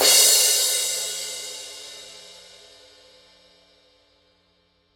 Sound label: 16 reflector full crash edge; signature